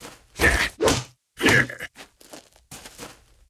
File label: Berserker Attack